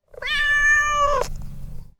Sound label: meow